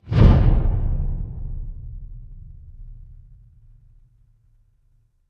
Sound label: fire electric whoosh flame